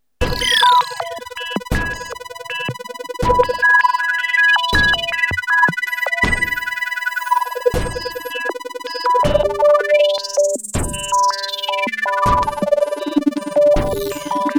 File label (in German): Sequenz